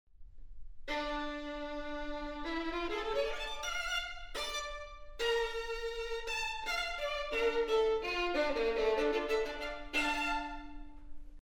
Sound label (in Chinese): xinqin; 斯特拉迪瓦里琴与新琴音质差距多大听听分辨一下